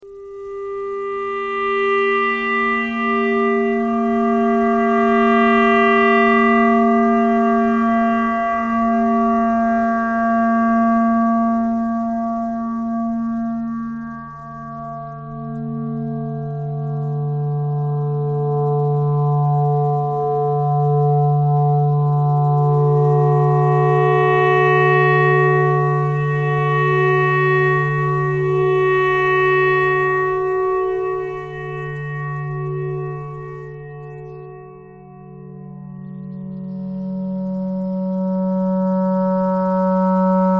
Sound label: clarinet clarinet thread noise